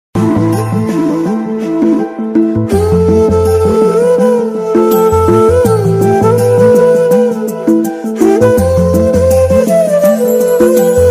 love flute music flute ringtone love ringtone instrumental ringtone